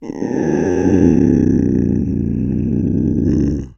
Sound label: Bobcat Snarl Low Grun; big cats